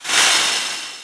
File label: air release